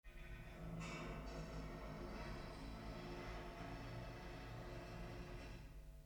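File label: quietly creaking door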